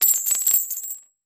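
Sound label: Cup Lrg Washers Dumped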